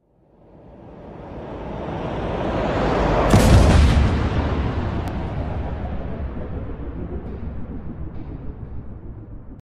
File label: Meteorite